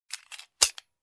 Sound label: Pulling gun slider